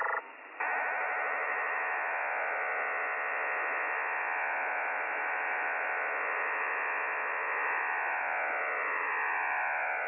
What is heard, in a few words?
DSSTV